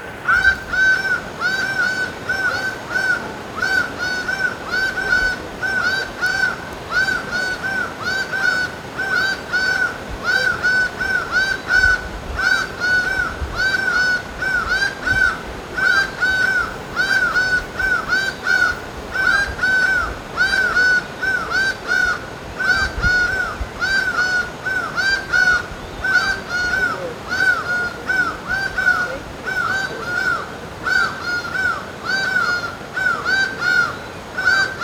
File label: laughing falcon, birds